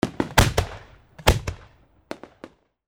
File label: fireworks multiple shorts